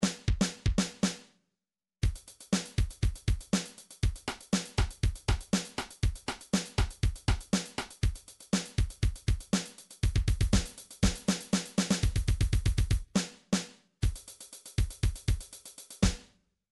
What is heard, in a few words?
drums beatbox, midi drums